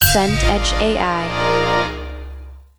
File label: fanfare short